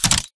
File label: Mvmt FS Walk; AI Tick; Titanfall 2